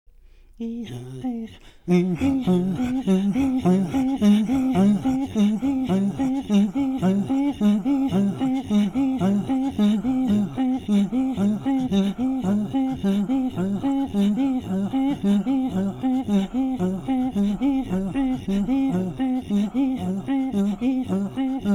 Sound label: Throat Singing, INUIT